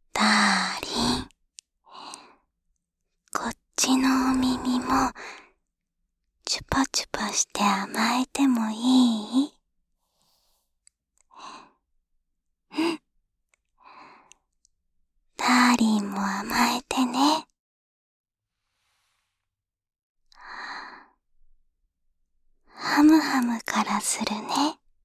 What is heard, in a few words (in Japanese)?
耳舐めであまえ編後半 左